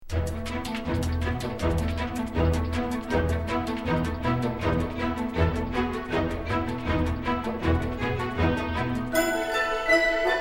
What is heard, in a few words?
music; Daddy Day Care Press Kit